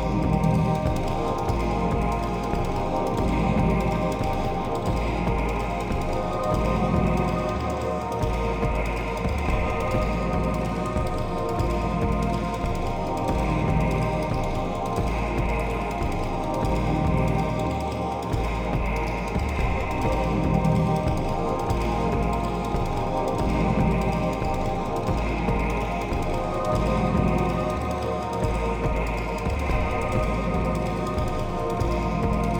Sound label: music, spacewar